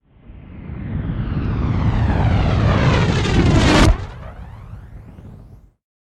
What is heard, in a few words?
Impactor